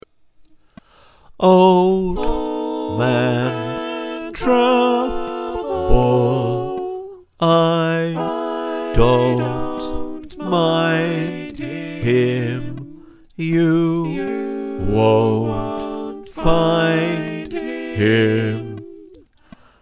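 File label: slow bass, choir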